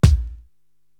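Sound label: bass drum EZ Hop, Korg TR-Rack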